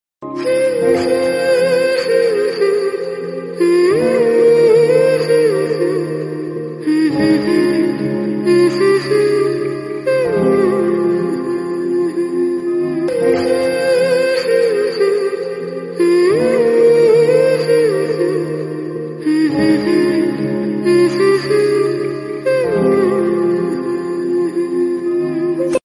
female version, instrumental sad flute ringtone, hindi bollywood ringtone